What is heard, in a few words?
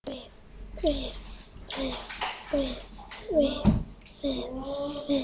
whip